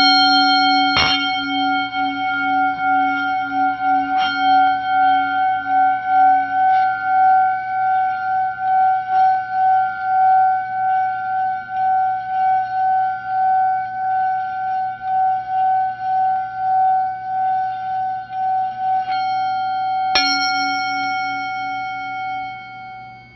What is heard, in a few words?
bowl